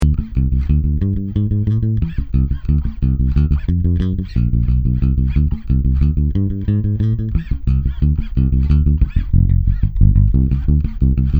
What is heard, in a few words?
staccato; tc electronic